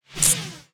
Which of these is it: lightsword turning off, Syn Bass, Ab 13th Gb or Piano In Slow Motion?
lightsword turning off